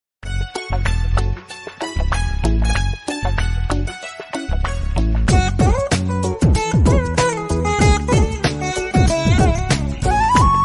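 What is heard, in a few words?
love background music ringtones telugu love ringtone background music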